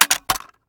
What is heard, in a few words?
lid up, pkm; svencoop; ins2